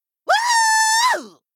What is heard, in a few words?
laugh; svencoop; gruntsparty; psychward